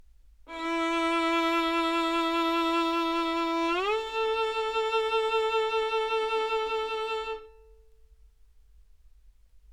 Two Note, violin

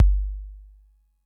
kick; MFB-522

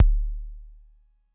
kick; MFB-522

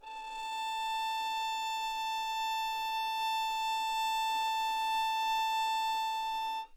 violin

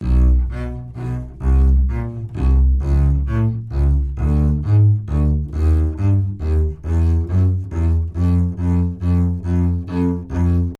double bass B1 phrase mezzo forte arco detache; solo instruments

